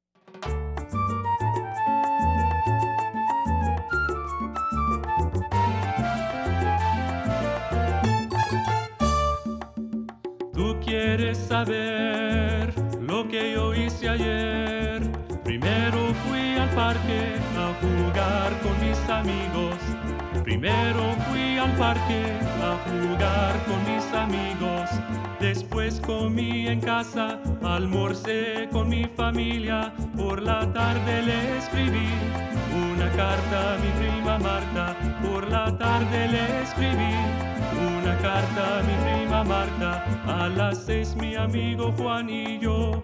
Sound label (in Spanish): (Vocals)